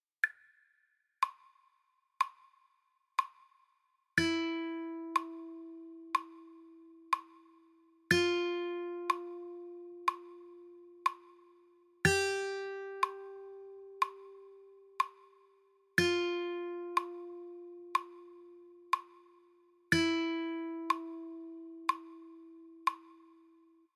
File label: 1st string, guitar